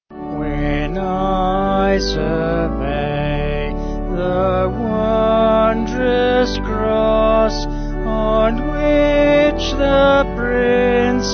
Vocals Organ; Rockingham

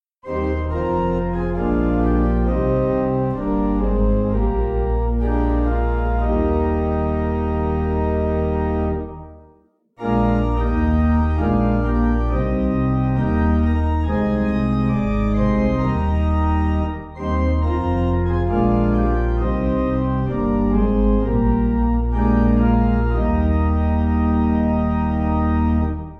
The Dearest Child, Pipe Organ

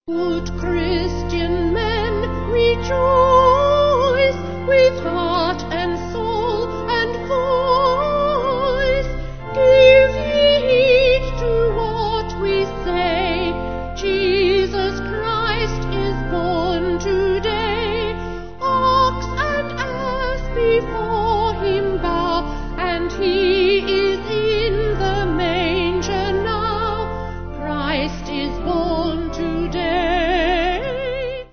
Vocal Organ; Good Christians All

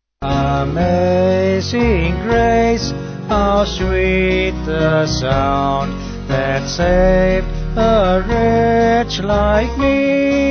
Amazing Grace Vocals Band

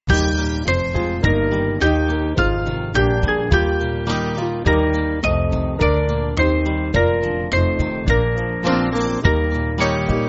Band, church music

